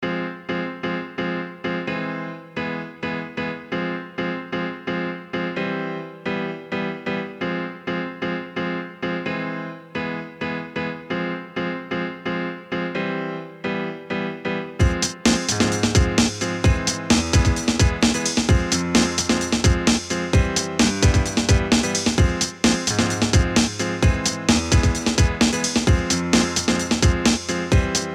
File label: Sax and sex